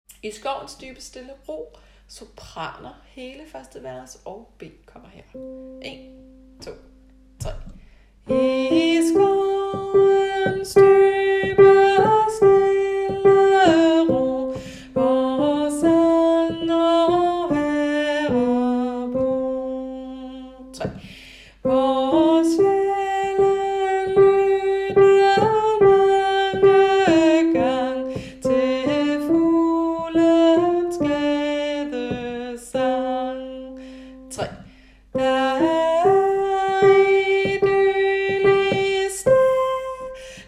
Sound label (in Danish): sopran